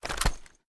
reload empty drum end; reloads; gmod; iw8

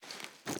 reload empty raise; reloads; gmod

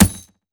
frag physics bounce grass hard; gmod; viper

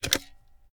reload fast mag out; gmod

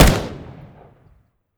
fire, weapons; viper

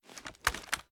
reload fast end, reloads; gmod

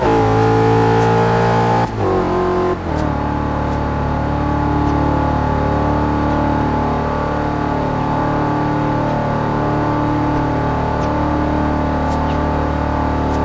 fourth cruise, vehicles; gmod; tdmcars; rs4

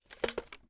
sn reload empty mag grab; gmod; iw8; alpha50

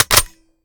reload empty fast drum chamber; reloads; gmod; iw8

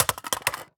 phys mag drop ak poly concrete, magazine drops

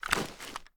reload empty fast rotate, weapons; gmod; viper

